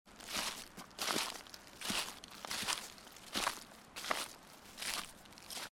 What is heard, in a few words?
Forest